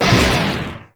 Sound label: Proj Large Fire; Warframe; Lizzie